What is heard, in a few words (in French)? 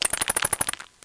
Cri